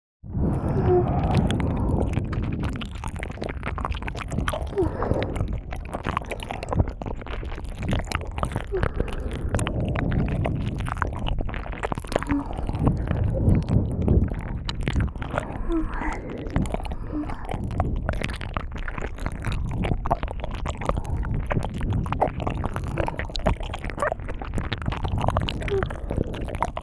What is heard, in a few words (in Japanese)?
お知らせ両耳舐め